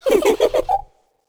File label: chasing; chiabihamaru; cv project; invading executioner; zerosiki; slender